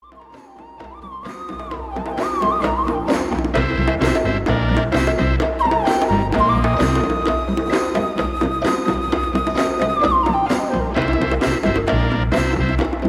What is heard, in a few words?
Indian Reggae